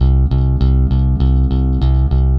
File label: BASS, ROCK POP; MAGIX